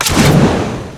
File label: powershot alt; windrunner